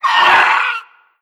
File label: pain, headcrab reviver; creatures; vox